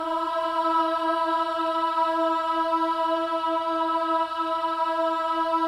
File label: BOYS AHS, Symphony of Voices